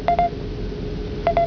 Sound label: sonar ping